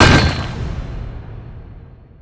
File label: hammer; havoc; dota